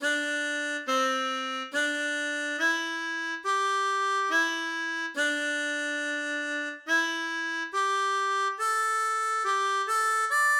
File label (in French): Hymne japonais, harmonica